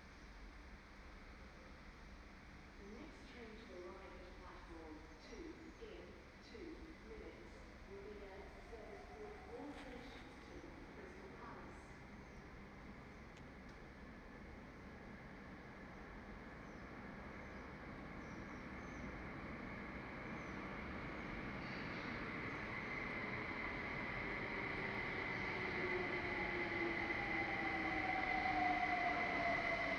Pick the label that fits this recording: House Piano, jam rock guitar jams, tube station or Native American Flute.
tube station